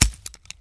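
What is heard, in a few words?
grass; Mafie 1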